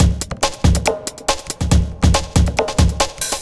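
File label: industrial 140 kit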